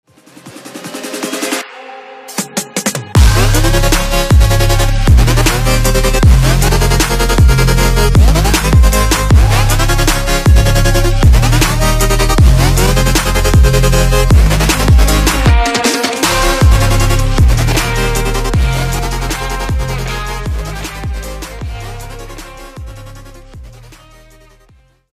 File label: future bass